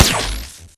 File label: imp bullet energy titan; Titanfall 2